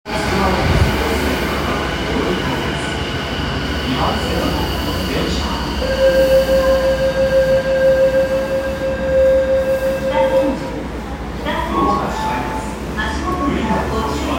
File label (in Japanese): 千代田線北千住北 小田急の列車, train sounds